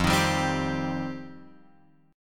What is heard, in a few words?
F 7th Suspended 2nd F down Guitar Open F; F7sus2; strummed; JGuitar